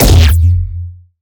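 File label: Sci weapon Shot Medium Blaster Power Charger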